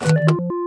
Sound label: Use Key